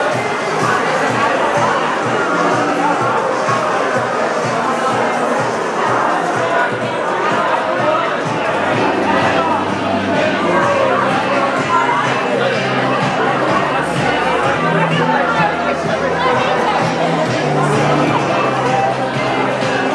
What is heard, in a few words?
w times square